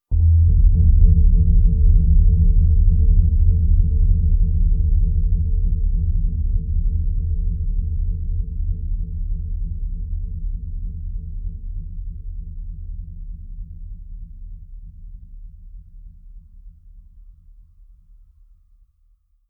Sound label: Pulsing Machine